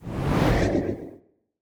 character menu flip light

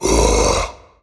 knight growl; selflessknight

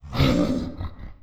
laugh; finalmother

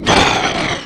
monster roar

